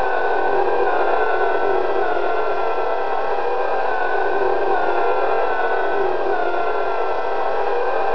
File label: horror; ambience